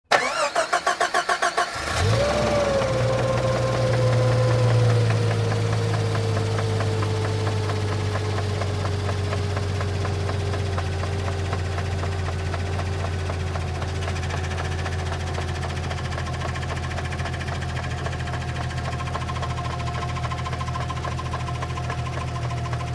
Car Transmission, bad transmission

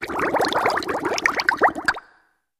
Fast Rev Bubbles, water

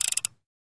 Oven Temperature Di, appliances

